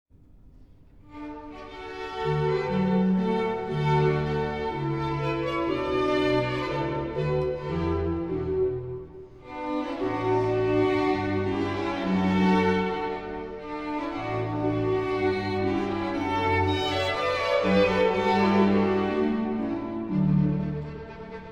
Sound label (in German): italienische orgelkonzerte